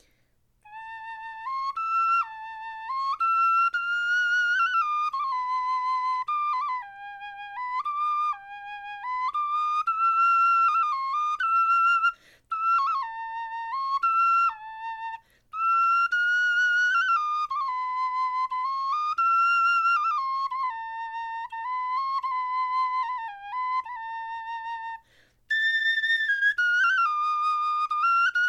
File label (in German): melodie